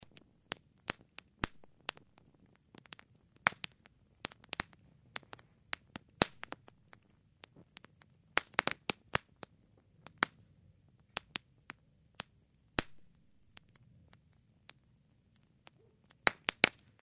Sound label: crackling fire